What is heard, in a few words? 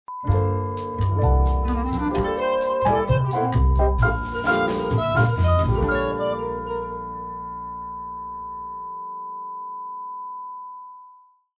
groovy jingles